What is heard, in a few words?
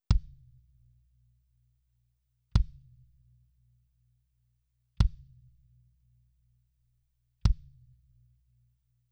Kick; Daking; Fet III